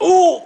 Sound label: stolen; super trench attack 2